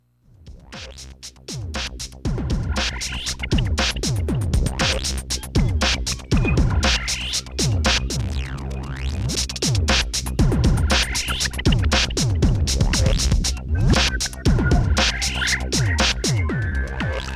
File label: menu song; Frequency; harmonix